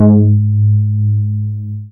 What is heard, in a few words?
BAS Mini, BAS1SYN; Best Service Gigapack 2